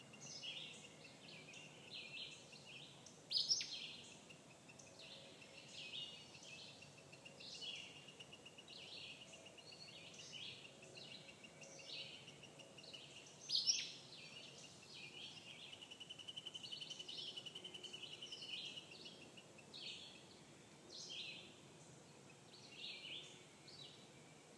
Acadian Flycatcher Empidonax virescens

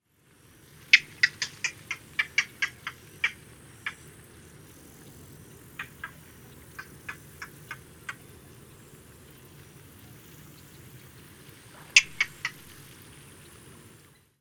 Green Heron Butorides virescens

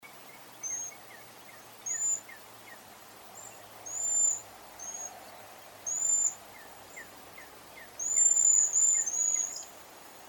Cedar Waxwing Bombycilla cedrorum

